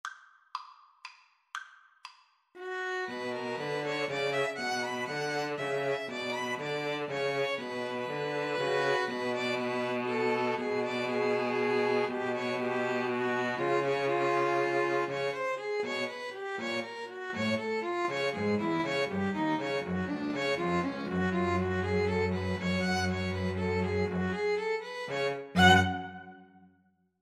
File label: 2 Violins Cello; grieg peer gynt